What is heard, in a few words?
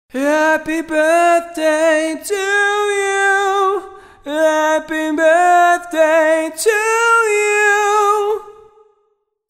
mixed voice